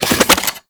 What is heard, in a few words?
Fist Spin; Warframe; Weapons; Archwing; Melee; Regor Fists